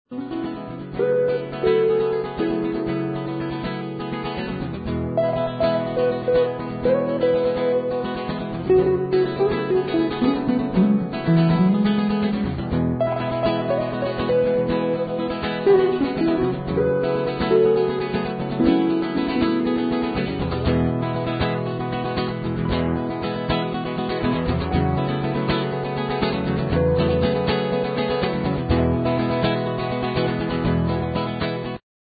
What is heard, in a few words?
music; directional sound